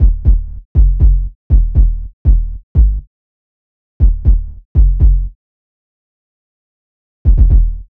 Bass Line; Bass Lines; Eclectic Electronica

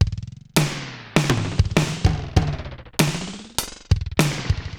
Digital Delay RX17 (100BPM), cyborg beats; musicradar

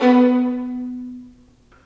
STR Vlns Marc; L-CD702; Vlns Marc1-3

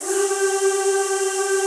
ZZZ G3, Classical Choir; Best Service; Extended Classical Choir

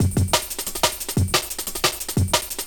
180 bpm Kid Break no Vocals; Breakbeats

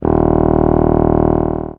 oboe, Mirage, d#1; ProSamples vol.55 - Retro Sampler

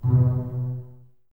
BSS B 2 Pizz, String Ensembles Basses; Miroslav Vitous

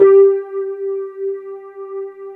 WET RD G3 L, Keyboards Old School; USB Soundscan vol.09; AKAI; WET ROAD

